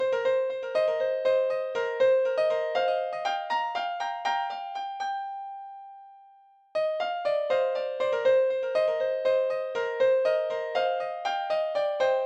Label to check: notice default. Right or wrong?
Wrong